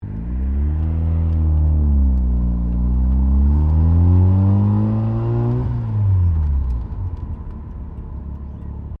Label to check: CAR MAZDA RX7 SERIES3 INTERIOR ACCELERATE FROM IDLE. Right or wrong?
Right